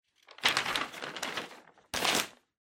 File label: Newspaper Flip